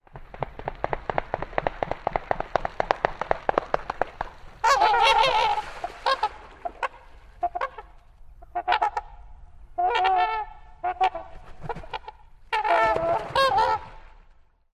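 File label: trumpeter swan wing-flapping & calls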